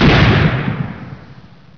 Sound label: BANG, Gunshots; sound effect